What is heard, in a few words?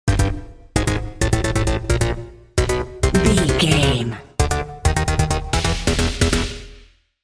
intense video game level stinger